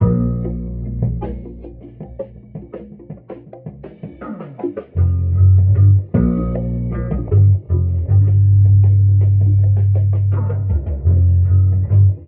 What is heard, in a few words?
bass, Loops